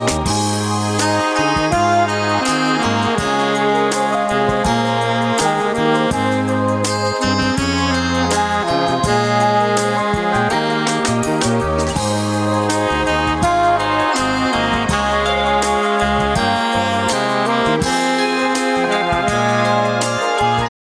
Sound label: alto, sax